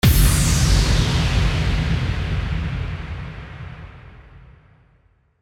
IMPACT

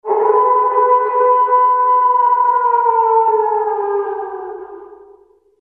SFX HALLOWEEN CREATURE HOWL

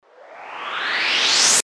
RISER

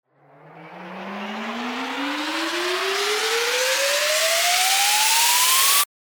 RISER

